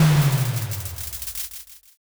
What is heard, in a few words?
firework burst